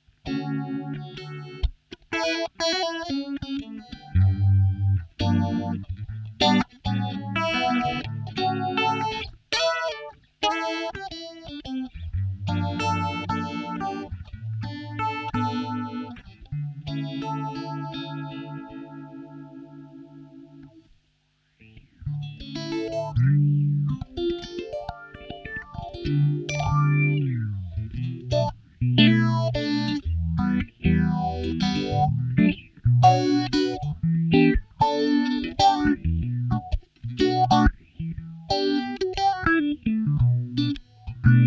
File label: vintage phaser; behringer pedales guitare